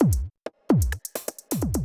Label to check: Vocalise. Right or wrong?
Wrong